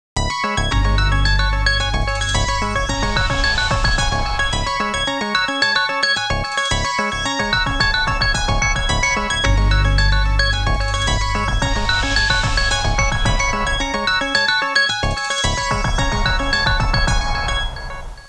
musica elettronica

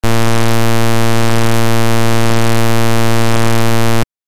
suoneria beep

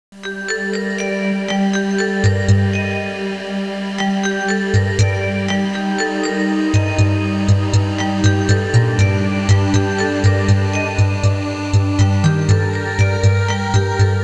musica elettronica; electronic

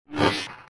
suoneria botton; beep

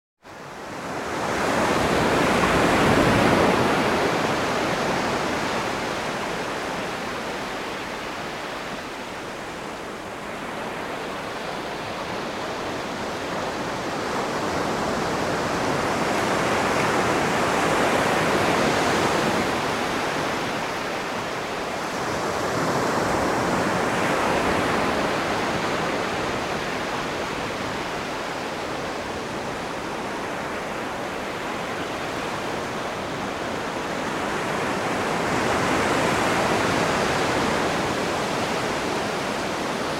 acqua water, natura

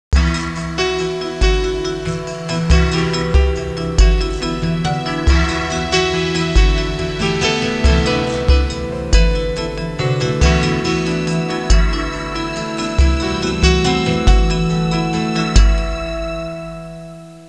suonerie musica hawaiian

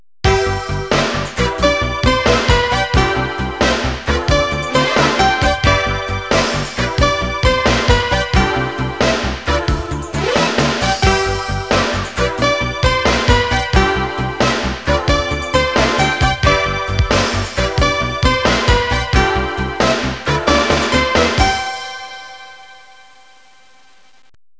suonerie businessy

